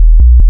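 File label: waveform FM sine triangle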